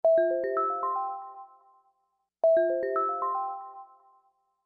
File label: Ring; alarm